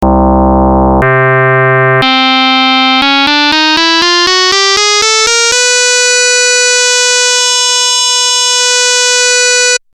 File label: log lin FM Virus